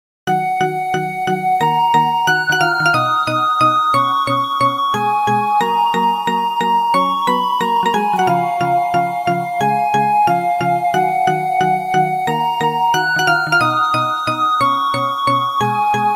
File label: flute and marimba ringtone